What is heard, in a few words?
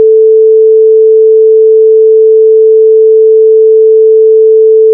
gapless sinus